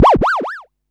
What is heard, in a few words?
Perc Synth Raw